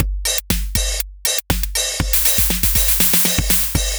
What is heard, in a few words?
erase overflow 120; maudio; Elektron